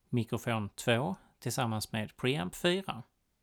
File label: mic pre